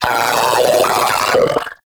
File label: Arachnoid Vocal Patrol; Enemies; Vocals; Warframe; Corpus; Arachnoid Hunger